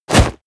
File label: hit armor leather